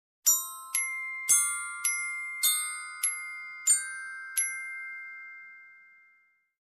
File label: Suspenseful glockenspiel melody